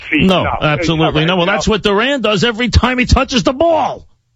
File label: SCREAM; WFAN